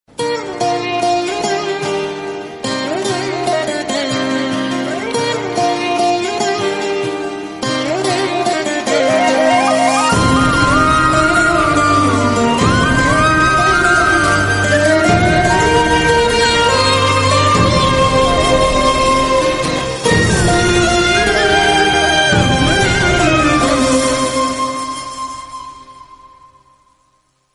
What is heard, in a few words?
bgm